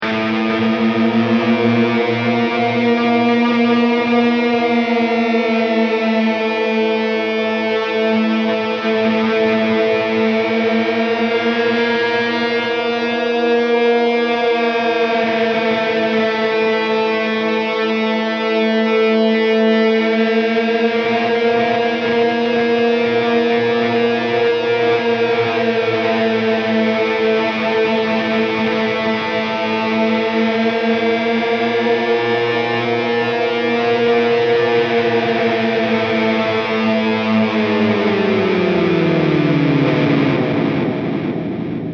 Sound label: choose team, music